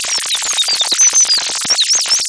wren resynth

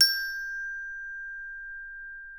glockenspiel

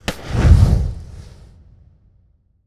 fire start

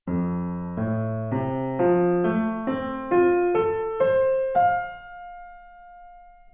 piano

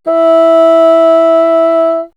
Bassoon ff E4; dsp2008 sound library

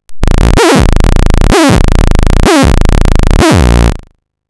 rhythm

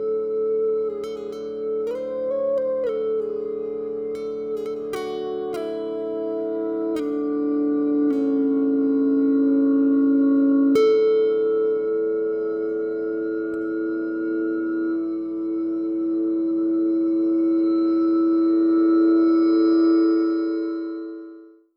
pulses, guitar

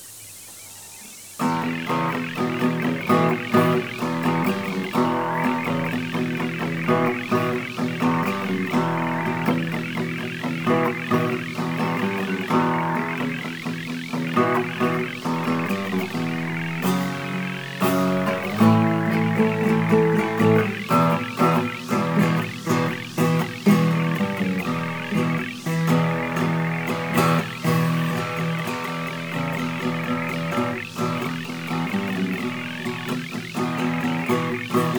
rhythm mono

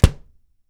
kick; dsp2008 sound library

